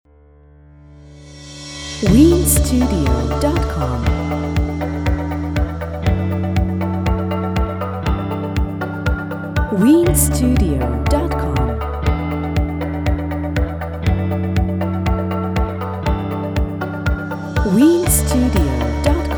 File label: Corporate Optimistic